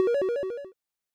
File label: win